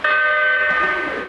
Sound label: Klaxon